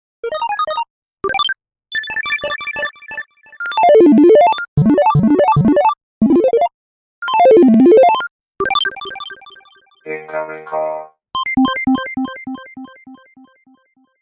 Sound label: 8Bit